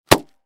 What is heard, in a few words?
Paper Bag Blow Pop; Foley; Materials; Blastwave